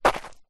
foley footstep single stoney path; zapsplat

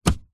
car clutch pedal press down with foot engine off; vehicles; zapsplat

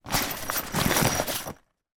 rucksack object shoes put inside

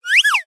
cartoon slide whistle

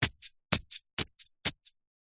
horror knife stab into body several times fast

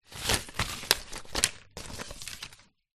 small card peices cut up movement; foley

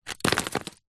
small rocks rubble drop pieces

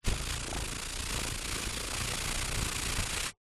animals insects moth wings flap fly close

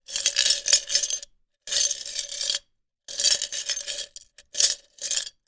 broken glass pieces inside glass jar movement; zapsplat

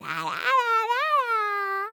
FUN MONSTER Cuty childish humming

